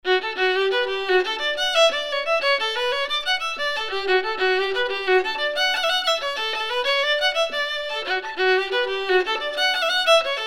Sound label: Violin w ambience